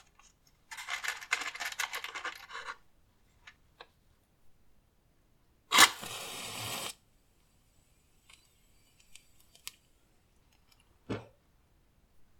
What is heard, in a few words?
Match Strike All